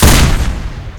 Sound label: Deagle